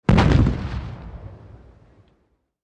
Tank Gun Shot Dist Ech, modern artillery exterior

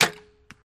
Archery Crossbow Sho

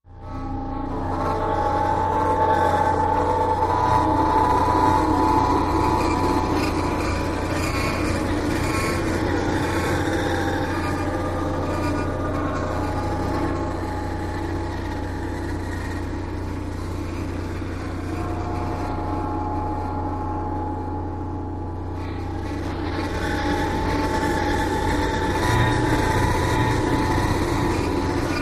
Inner Tension Metallic, eerie ambience

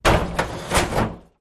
Axe Hits Door Heavy Wo

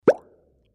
Bubble Pop Plain; bubbles

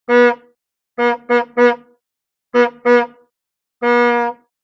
Horn Austin Taxi Circ

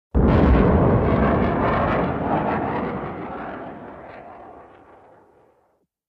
Surface to Air Missile Lnch, exterior

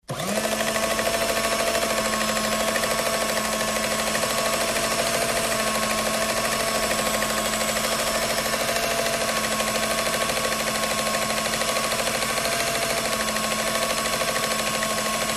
Food Mixer Strt Run St, appliances

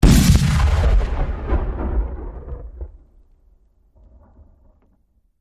Cannon Blast Big Low E, shots

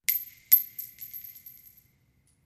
Shell Case Sngle Revb, shell casing movement

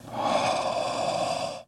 Werewolf Breath, Airy Quiet

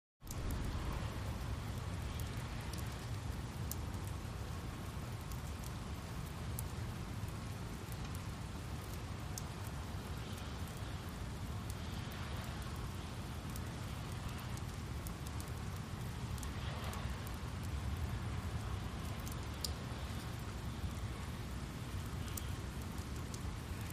Rain; Light, With Distant Traffic.; wet traffic

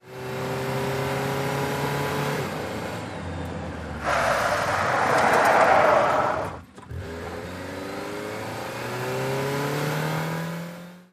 Sm Car 180 Skid, compact

